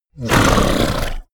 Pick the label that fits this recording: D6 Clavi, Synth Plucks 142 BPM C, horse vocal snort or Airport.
horse vocal snort